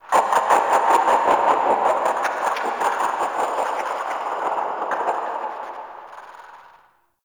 Crackly Birds; Ambience; Warframe; Shadowgrapher

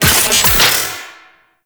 Iris Door Open A; Ambience; Gameplay; Warframe; Corpus Railjack; Comet Shredder

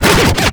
Charge Done, Infested, Arm Cannon; weapons; Warframe; Coda Bubonico

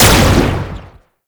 Gunbrella Shot; Warframe; Tenno; Koumei

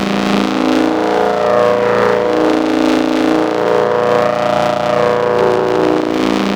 Ambient Tech Drone; Warframe; Tau; Ambience

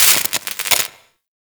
UI Crafting Spark

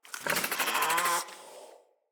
Infested Charger Idle

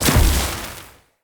Casting Device Block Parry; Weapons; Player

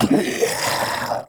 Flying Mouth Death; Warframe; ManInTheWall

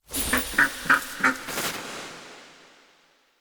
Duck Mote; Animal Motes

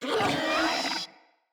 Infested Charger Death

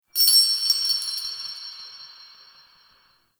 Metal Chimes; Ambience; Warframe; Nokko Colony

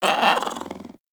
Kitten Speak Playful; Warframe; Catbrow

